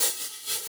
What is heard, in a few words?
Open Hhat, Hihat; Equipped Music; Loungin House